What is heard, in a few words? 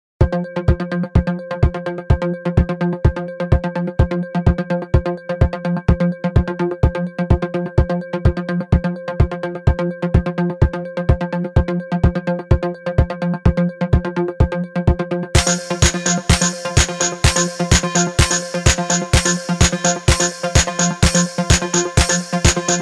ELEKTRO NOISE